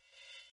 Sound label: gravity down; free rider hd